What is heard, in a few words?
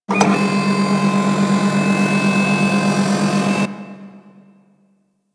Ship Turret Move B; Player Path Beam; Fomorian